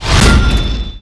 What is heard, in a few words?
rank up; gmod; paris